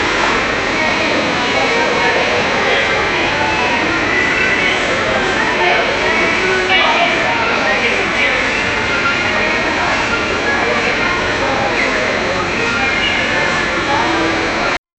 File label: sounds of a small store, store